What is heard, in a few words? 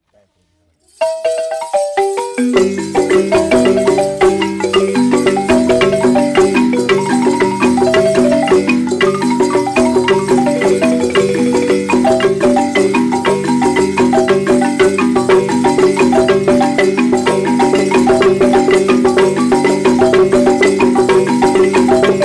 Balafon sambla